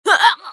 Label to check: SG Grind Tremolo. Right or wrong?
Wrong